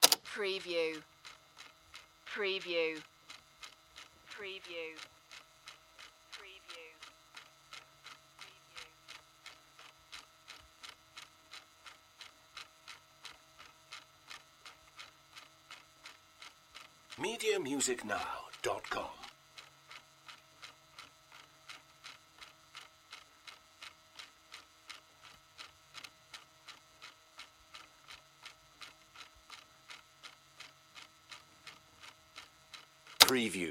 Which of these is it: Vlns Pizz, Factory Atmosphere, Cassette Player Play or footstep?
Cassette Player Play